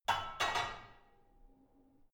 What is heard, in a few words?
barbell only replace unsteady soft; sport freizeit; fitnessstudio